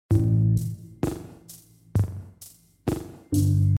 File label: whistle